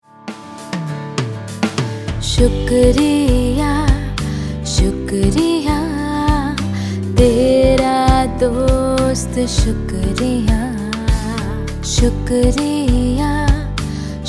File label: THANK YOU HINDI FRIEND TO FRIEND FEMALE